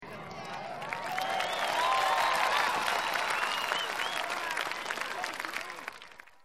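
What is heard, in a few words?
firework; Heitz System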